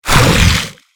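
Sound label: Overcharged Blaster Plasma Travel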